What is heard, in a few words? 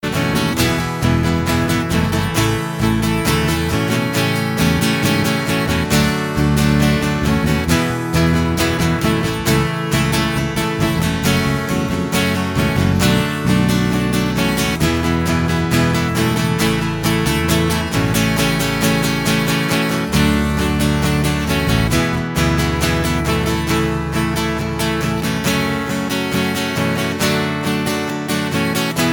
music; sunday message 2018